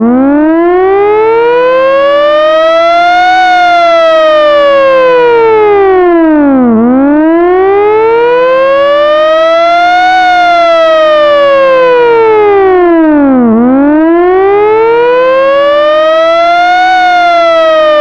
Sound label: vintage hi lo siren